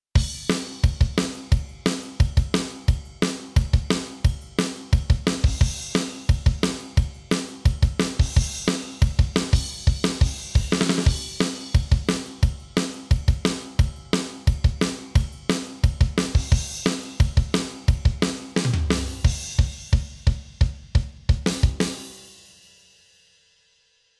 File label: drum On